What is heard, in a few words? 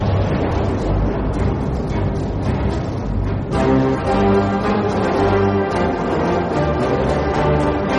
soviet march bass boosted